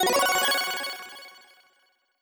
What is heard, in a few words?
accent brilliant high